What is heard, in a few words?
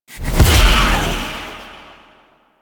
Kavat Teleport; Warframe; Warframes; Khora